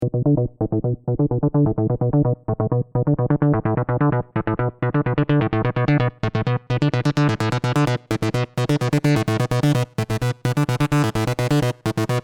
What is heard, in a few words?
bass synth seq bass